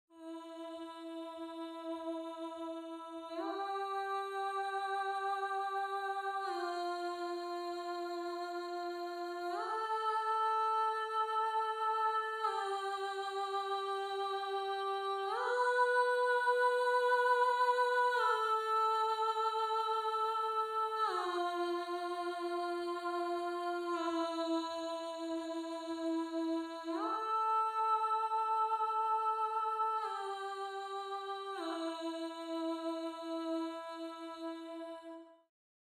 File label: Alto